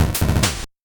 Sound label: 8bit se